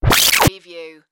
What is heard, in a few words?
Turntable Scratching Reverse